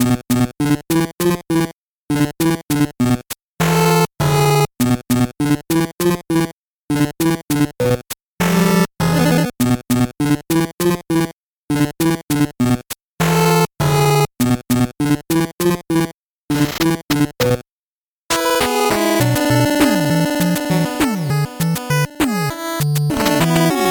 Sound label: 8bit bgm normal